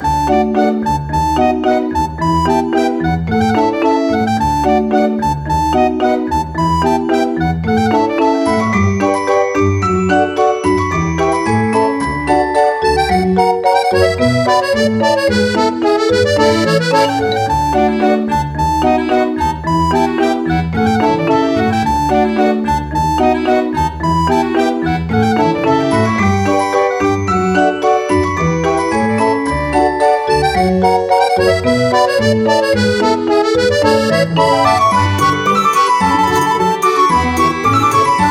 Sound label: Laid Back loop